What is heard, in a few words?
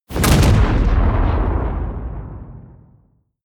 detonation